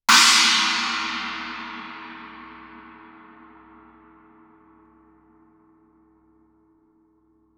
chinese stick shoulder ff stereo, Suspended Cymbals; Percussion